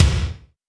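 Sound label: bass drum, Real Mega Drums; Best Service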